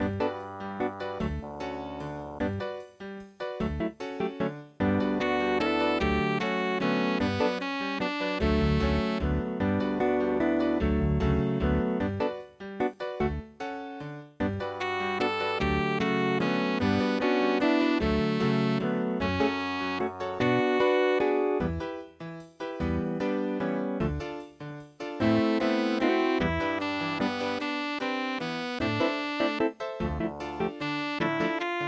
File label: jazz waltz